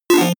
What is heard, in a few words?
feedback negative 8bit